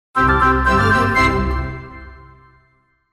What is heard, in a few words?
classical orchestral sound